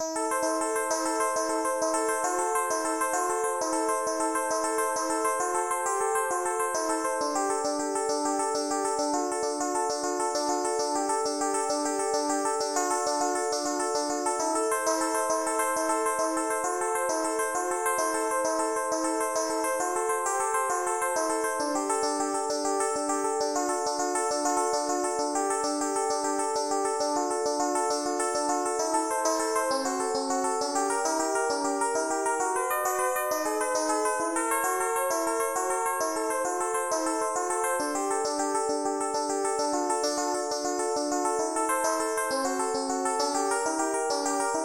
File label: 24EDO Mohajira Minimalist Prelude; Mohajira7; Nick Vuci